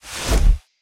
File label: Broom On Release; Basilisk Broom